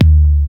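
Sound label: bd, 808 bass drum; Best Service Gigapack 2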